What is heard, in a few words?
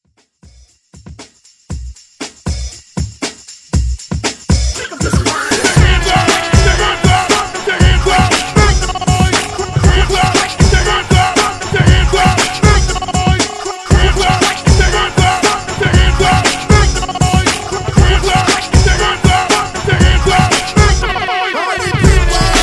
Throwback Party Break Intro